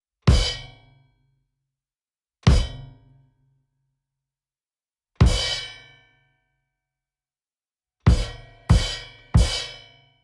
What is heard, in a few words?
cymbal kick